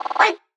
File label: vocal stutter A; SD AURORA Sample Pack; Slate